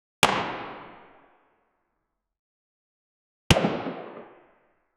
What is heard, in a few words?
arrow shoot; bow and arrow